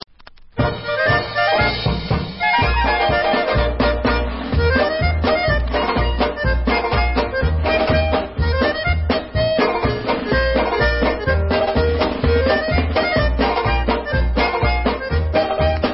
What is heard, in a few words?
Happy Polka